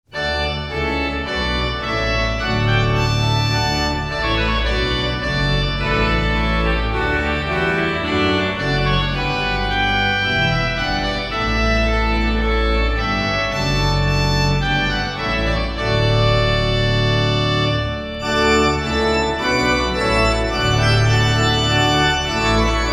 trumpet tune in D; johnson

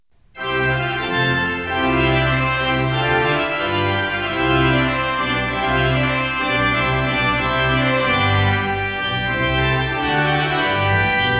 Trumpet Tune in C Major; Manfredini

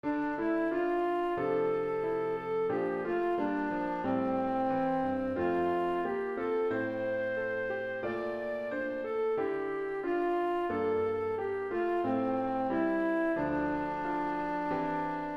Psalm 42 Melody; Psalm 42 As the Deer

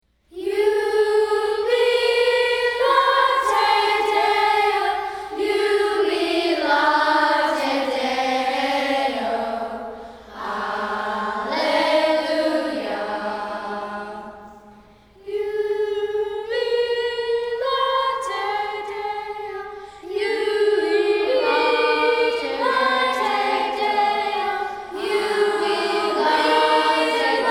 Jubilate Deo Childrens Choir

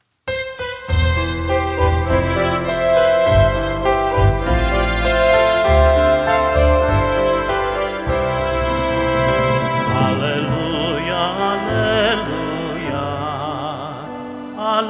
Festive Alleluia

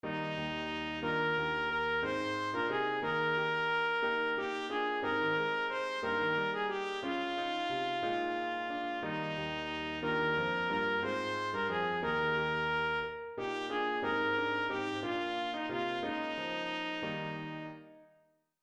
Send Forth Your Spirit Melody

